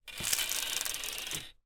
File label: household blind venetian down